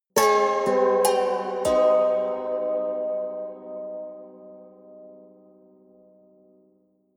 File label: haunted strings sequence hard